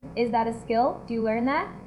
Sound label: beat